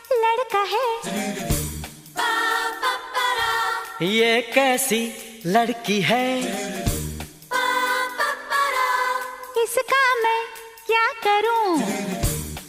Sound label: romantic bollywood ringtone